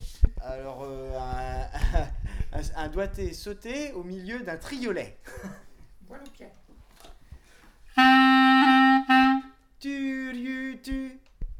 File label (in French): clarinette; ex doigte saute triolet